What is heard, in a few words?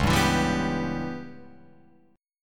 C sharp Minor 7th Flat 5th C sharp Guitar Open F; C#m7b5 strummed; JGuitar